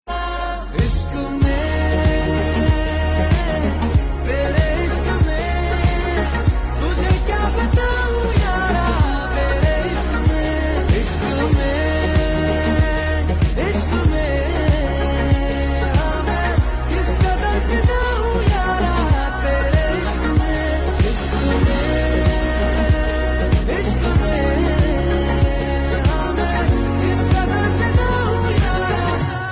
Ringtone

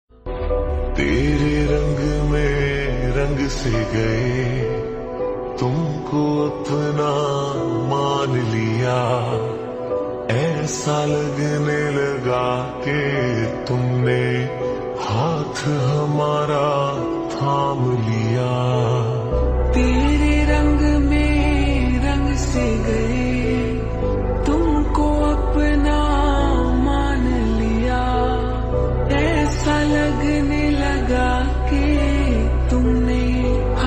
Hare Rama Hare Krishna Ringtone Slowed Reverb

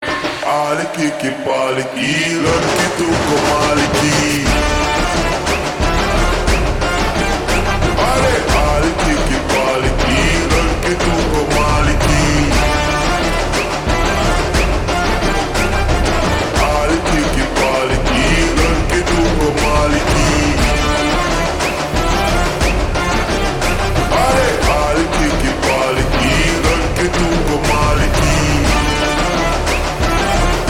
Ringtone Slowed Reverb

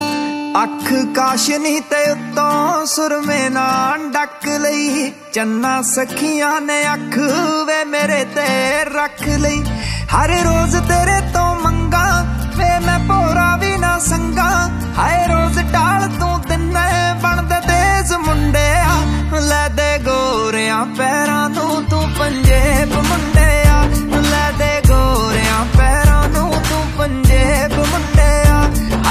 Ringtone

